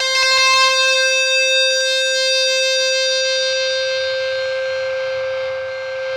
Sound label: ZWEEP, Synthy Tonal; Distored Reality